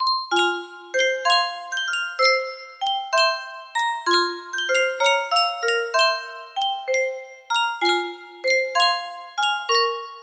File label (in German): yunsheng spieluhr